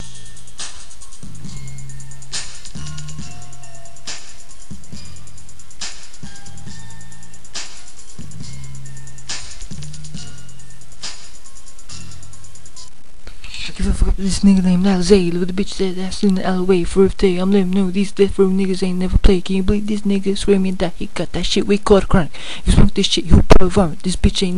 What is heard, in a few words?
dopey beats